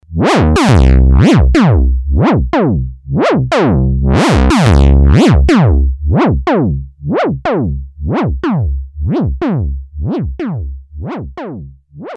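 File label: synth lfo bass, Moog Minitaur Bass Synthesizer; another LFO; analog monophonic synthesizer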